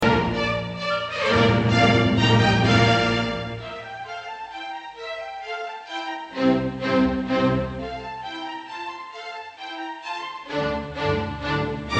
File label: Menuet Trio Allegro